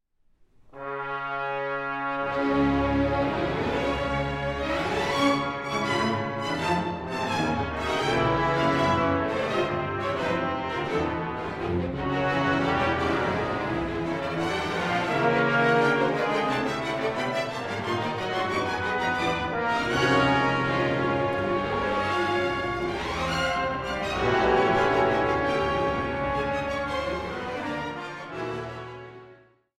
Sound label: Brass; Konzertmusik; Brass of the Royal Concertgebouw Orchest